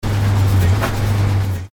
steam engine

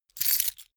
key ring large grab hard

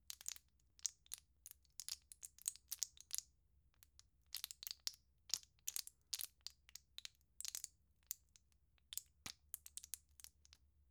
plastic blister handle click

